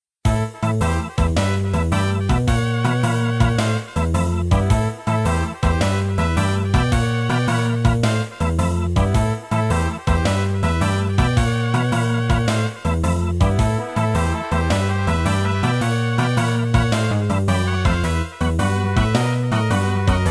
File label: Terrormisu Character Theme